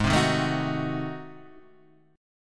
Ab 7th Flat 9th Guitar Standard, chord; Ab7b9 strummed; JGuitar

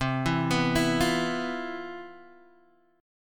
C Major 11th C, Guitar Standard; CM11 arpeggiated; JGuitar

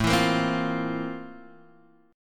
C 13th A; strummed

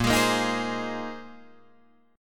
Bb 9th Suspended 4th Bb Guitar Drop D, chord; 8,11,8,8,9,8

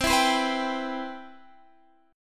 C Major 7th, Guitar DADGAD

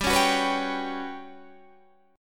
G Major 7th Flat 5th, Guitar, DADGAD; down

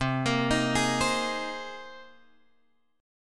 C Minor 7th Sharp 5th C Guitar Drop D

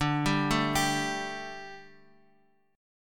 D Suspended 4th D, Guitar, Open F; Dsus4 arpeggiated; JGuitar

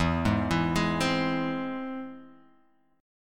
E Augmented, Guitar, Half Step Down

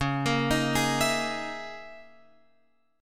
C sharp Minor 6th Add 9th, Guitar Open F; arpeggiated; chord; JGuitar

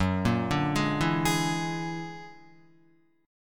F sharp Augmented 9th F sharp Guitar; F#+9 arpeggiated

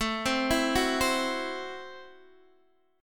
A Minor 6th, Guitar DADGAD

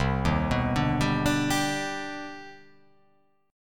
C Minor Major 9th, chord

